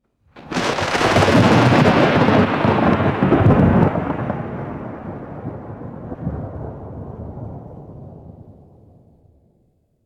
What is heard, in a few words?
loud thunder clap and deep rolling rumble; weather